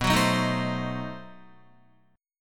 B 7th Flat 9th B Guitar; 6,9,6,8,9,8; strummed; JGuitar

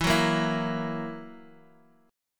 E Suspended 2nd Flat 5th E down Guitar Open D

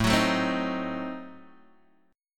A Diminished 7th A down Guitar Open D

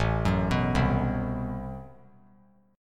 A Minor 6th Add 9th A, Chord; Am6add9 arpeggiated; 9,13,14,10; JGuitar

